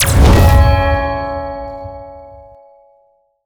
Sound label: bonus Scatter; netent